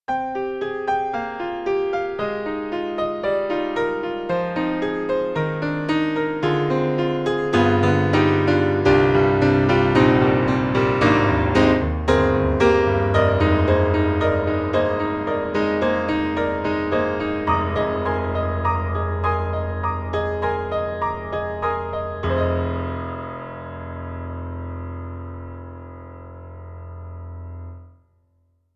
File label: Yamaha C7 Japanese Piano and Hall; Steinberg; The Grand 3